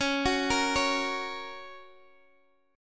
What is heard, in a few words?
Gb Major Db